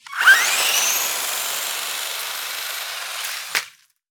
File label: RC Car Crashing, Buggy; Reely Core Buggy; SoundBits